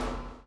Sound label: CHAIN; Spectrasonics - Backbeat